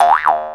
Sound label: Jaw Harp Oeo; Planet Earth; Digital Sound Factory Planet Earth